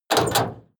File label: lock metal door